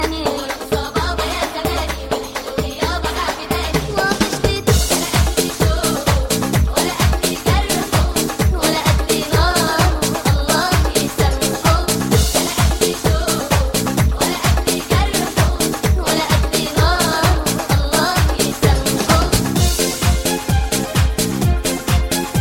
arabic dance mix

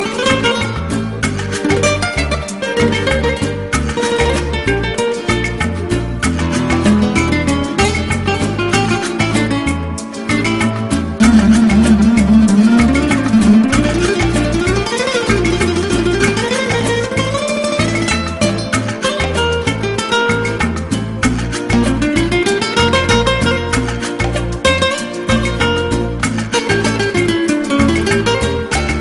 romantic spanish ringtone

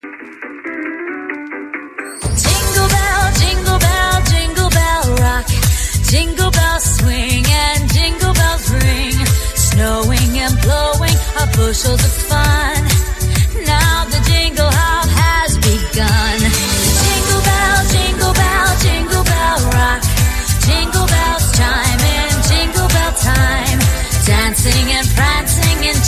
bell dance, ringtone

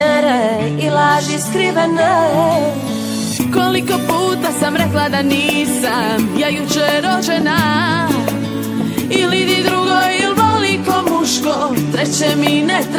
croatian song

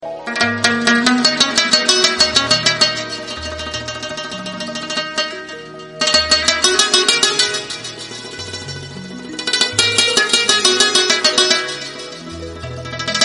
rabab pashto

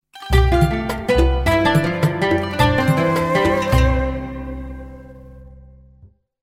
arabic nokia

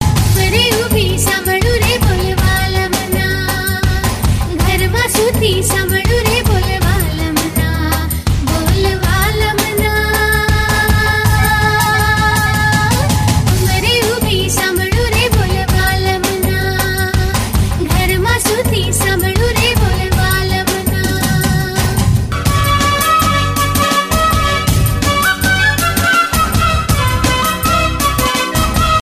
Gujarati ringtone

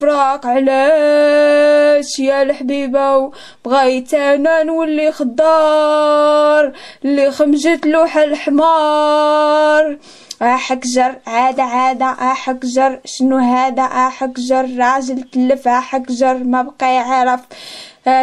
moroccan songs funny ringtone

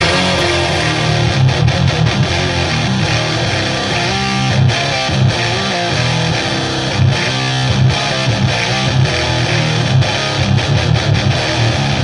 latest swedish death metal guitar ringtone

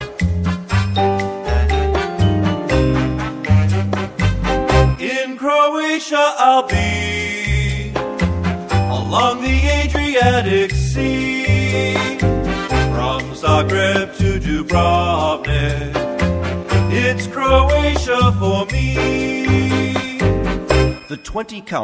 croatian kids ringtone

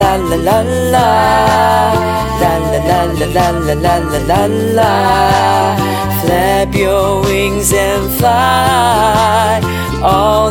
most beautiful singaporean ringtone

